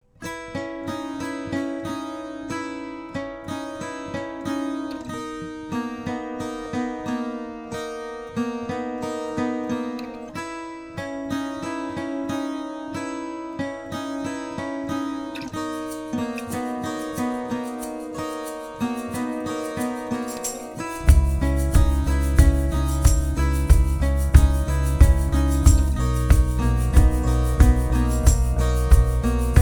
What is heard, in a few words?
Dissonant Picky